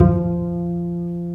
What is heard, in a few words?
Cb Pizzicato, String; Roland - String Master Series